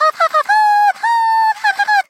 Burning; Teto; SM64 CO-OP; Triple Baka Pack

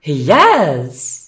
haha; rosalina; SM64 CO-OP

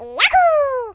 yahoo; yoshi; SM64 CO-OP

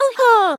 Haha; Neru; SM64 CO-OP; Triple Baka Pack

